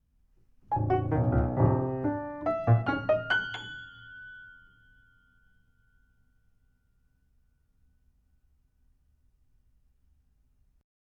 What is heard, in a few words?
Piano